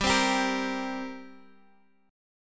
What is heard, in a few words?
G Minor Double Flat 5th, Guitar, Half Step Down